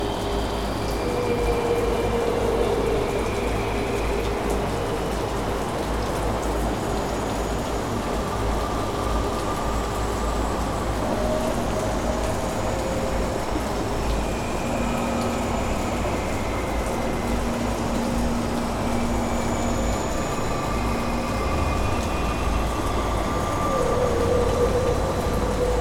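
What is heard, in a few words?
ambience - sheltered rain exterior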